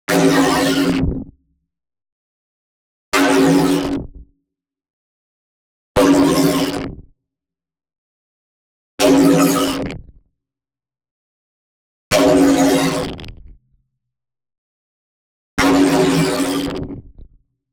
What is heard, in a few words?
neon hit small little flicker; boom library